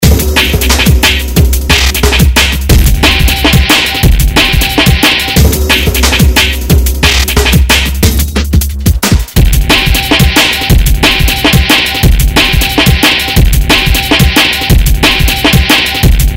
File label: like a drum